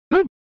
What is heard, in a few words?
Fighting Shout; SNES